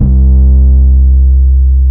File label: C BASS; Zero-G; Chemical Beats; BASSES